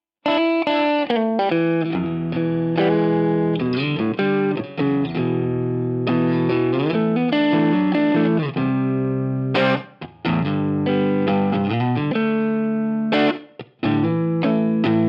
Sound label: Dirty Middle - Marshall Plexi, pickups